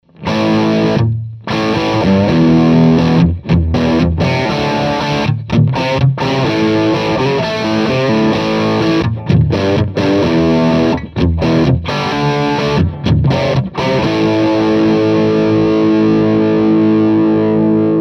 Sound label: low end crunch